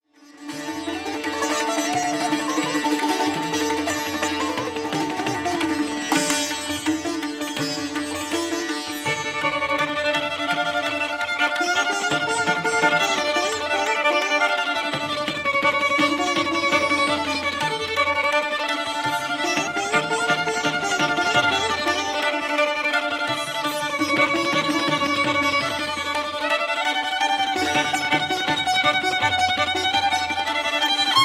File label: sitar violin duet